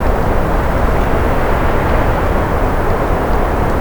wind; avant gardens

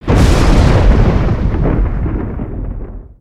GF Lightning; gnarled forest

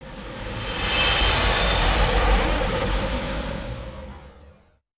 Death Whoosh; foley

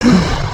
idle, creatures organic

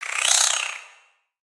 Spider Idle Bored; creatures mechanical; Lego

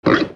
Pet Warthog Grunt; creatures pets

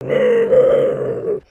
Stun; Skullkin; creatures organic

